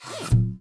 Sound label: Tooltip In; Worms3D